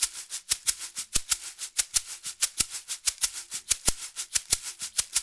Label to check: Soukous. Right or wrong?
Right